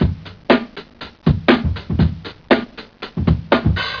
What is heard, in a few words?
Acoustic, breakcore Breaks